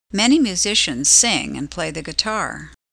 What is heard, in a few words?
guitar